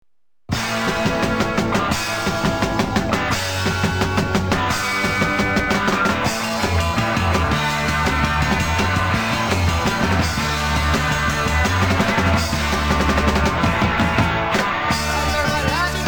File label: eat